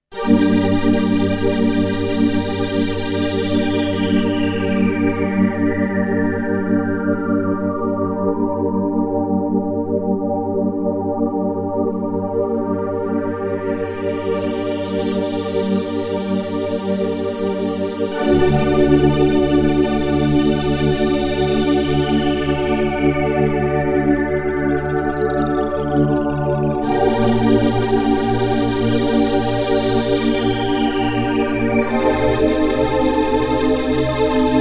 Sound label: Deep Space, yamaha sy77 pads